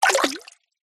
multimedia game designed water splash

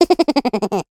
cartoon character laugh; zapsplat

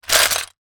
shells movement many; foley; zapsplat

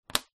household fridge magnet place on fridge door

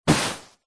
household beanbag person kick impact

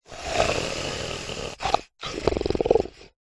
aminal wild could be big cat snarl

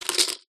poker chips stack knock over

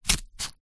office scissors cutting cardboard single

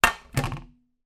household frying pan place down on glass top

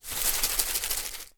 food seasoning large pot shake onto food fast

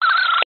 cb radio static interference burst; technology

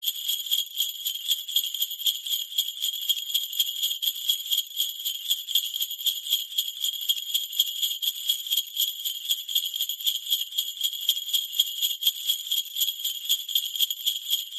musical large sleigh bells med shake

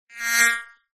cartoon fly pass by; zapsplat

